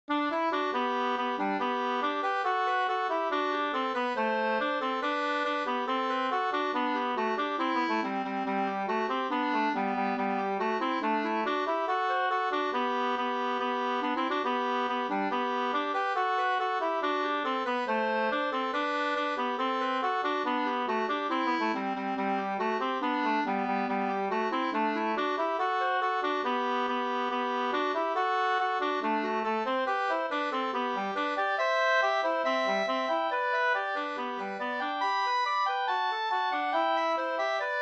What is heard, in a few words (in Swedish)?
schottis 4-4